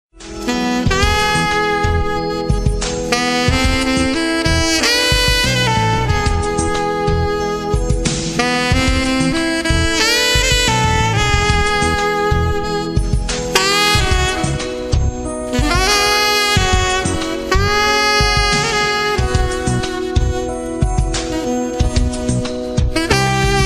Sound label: CONTEMPORY BALLAD